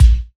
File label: BDRUM; E-Lab; X Static Goldmine 2